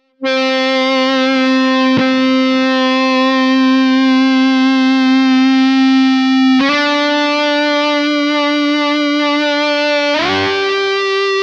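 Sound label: Sustainiac Neck Pickup Highgain, guitar; Schecter C-1 FR-S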